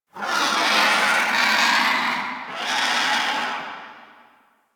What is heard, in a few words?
Dungeon Island Vultures, Ambience; Warframe; Duviri